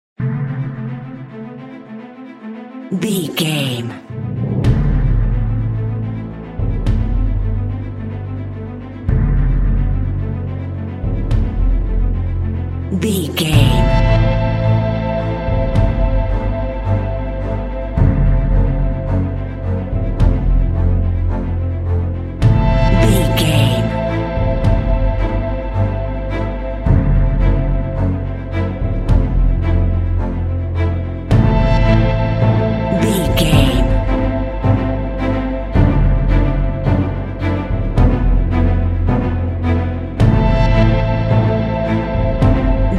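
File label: epic dramatic action trailer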